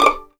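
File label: D6 pizzicato mezzo forte right, violins; East West; The Ultimate String Collection; VLNS PIZZ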